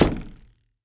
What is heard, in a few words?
Arrow Hit; timelock vr